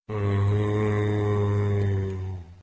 Alert, Horror; ESAN VO Sound Schemes; ESAN Horror pack

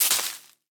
leaves land; Golden Crayon Sounds

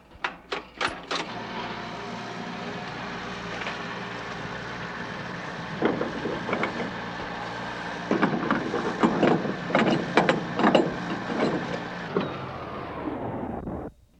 gas; RS Games Sounds

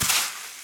deep sand step; Original TK Sounds

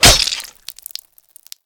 impact; Original TK Sounds

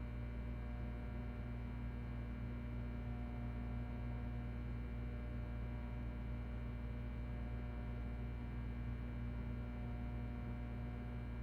room tone; Golden Crayon Sounds

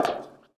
bleacher step; Golden Crayon Sounds

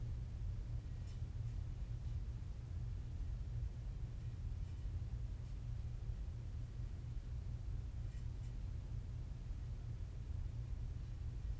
room tone; Golden Crayon Sounds

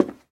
carpet step; Golden Crayon Sounds

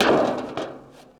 bleacher land; Golden Crayon Sounds

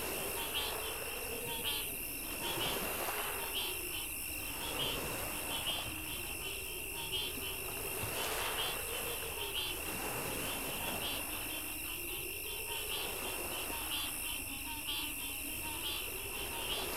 marsh; Golden Crayon Sounds

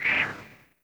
Keyboard Up; leopard iPhone

